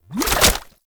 Shotgun Reload; Tenno; Alchemist Shotgun Deluxe

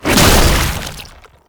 Underground Attack Stop; Warframe; Abilities

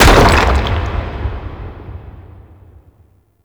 Rock Stairs End; Warframe; Orokin Moon; Ambience

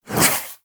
Warfan Whoosh, Weapons Melee; Warframe

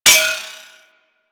Shot Projectile Bounce; Disc Moa

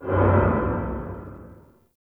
Low Mech; Ambience; Warframe; Nokko Colony

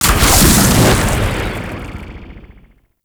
Corruption Fracture Open; Warframe; Zariman; Missions

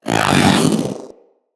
Companion Vocal Attack Melee, Pets; Zanuka

